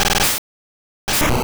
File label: Rapid Spin; sfx; attack moves; pokemon; gen 2; gsc